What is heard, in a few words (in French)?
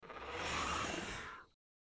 Ptera Fuite, Ptéranodon; Jurassic Isle RP